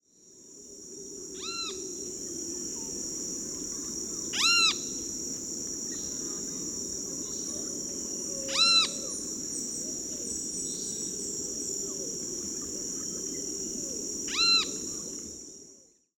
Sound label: pitangus sulphuratus, Aves; Gandoca-Manzanillo; historia natural; Galería Biodiversidad